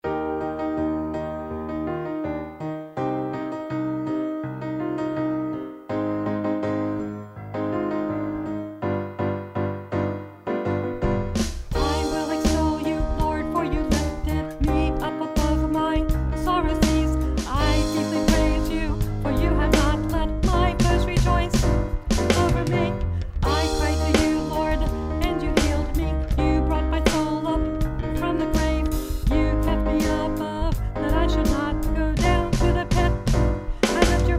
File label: Psalm Uplifting